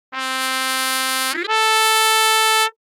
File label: mixed, trumpet; samplemodeling